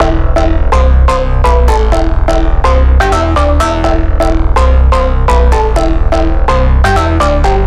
Big EDM Bass Loop E2; Future House; Future House Tools; Bass Loops

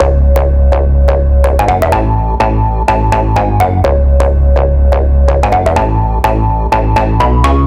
Big EDM Bass Loop C#2; Future House; Future House Tools; Bass Loops

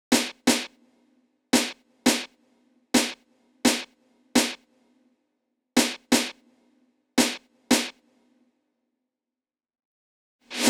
Snare, 085 Bmin, Drum Tracks

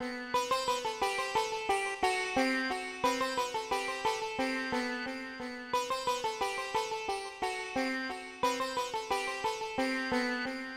Sitar, Hip Hop Exotica; 089 Bmin; Big Fish Audio

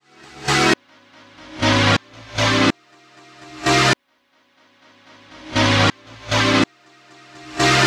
music crystal Am R, Reverse; Drop; House Tools; Ableton Projects; Big EDM 125 bpm (Drop) Project

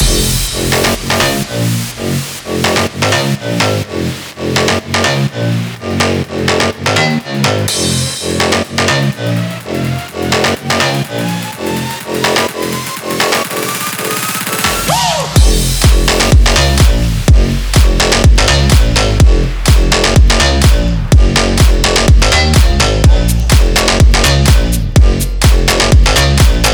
Pump mix 125 BPM F; Big EDM; Future House Tools; Construction Kits

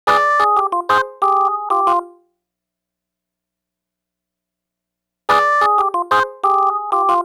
Organ, Hip Hop, 092, Dmin

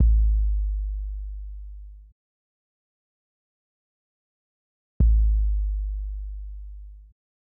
Kick B, Drum Tracks, Amin; Hip Hop; Big Fish Audio; Hip Hop Exotica

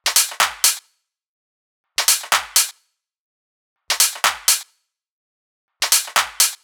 Top loop, EDM 125 bpm; Future House; Drop; Ableton Projects; Big EDM 125 bpm (Drop) Project

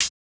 Hat Hit; Hip Hop Exotica; Apple Loops; Big Fish Audio

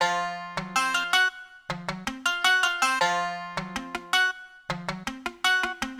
Ethnic, 080 Fmin; Hip Hop Exotica; Big Fish Audio

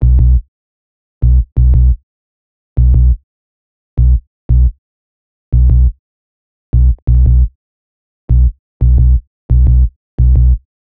Bass, 087 Bmin, Hip Hop Exotica; Apple Loops; Big Fish Audio

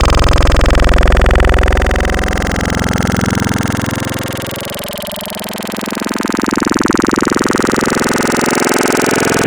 Growl Wavetables; Revert; Cymatics; SERUM; Virus Wavetables

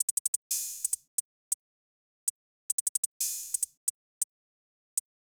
Hats, 089 Cmin, Drum Tracks; Hip Hop; big fish audio; Hip Hop Exotica; Apple Loops

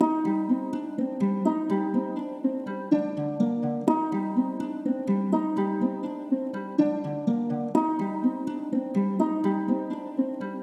124 BPM C Min Guitar; Cymatics; Destiny Gold Expansion; Mount Fuji

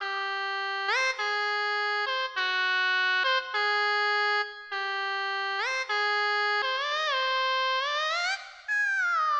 Shana, 102 Cmin; Hip Hop

